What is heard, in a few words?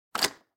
clock completed